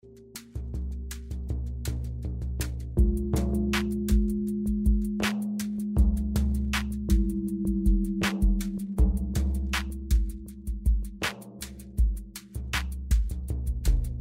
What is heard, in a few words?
Instrumentals